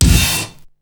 drum hit; SoundFactory; SMPMANIA; EFFECTS

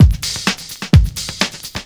LOOP; SoundFactory; DRUMLOOP

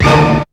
HIT, STAB; SoundFactory; SMPMANIA

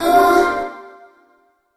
Processed Vocals, Loops

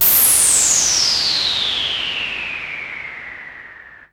NOISE; SoundFactory; SMPMANIA; EFFECTS

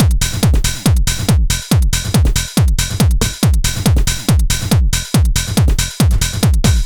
Dance Drumloop; Drums; Dance Beats

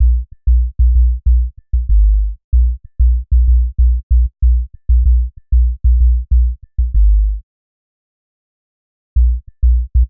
Synth Bass; Basses

